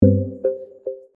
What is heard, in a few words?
click out